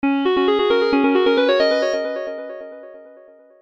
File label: Arpeggiato